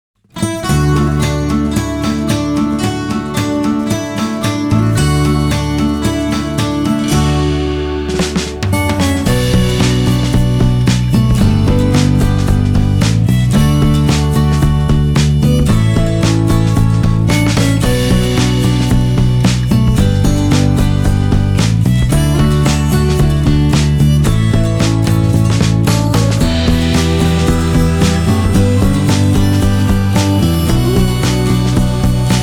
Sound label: Restaurant Music; Devon